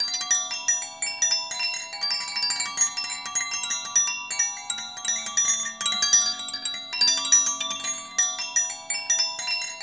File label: MONKEY CHIMES; RHYTHMLOOPS; Rarefaction; Poke in The Ear With A Sharp Stick